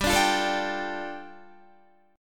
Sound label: G 7th Suspended 2nd, Guitar; G7sus2 strummed